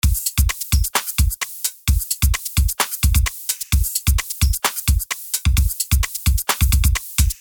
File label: dental perc loop